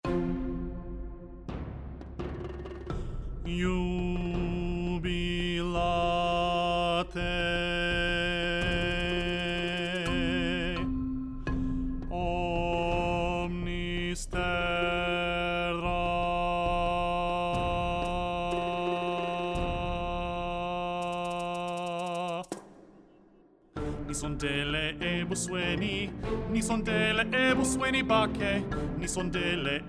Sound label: Bass Only